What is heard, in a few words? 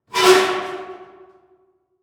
Foley Metal Squeak Quick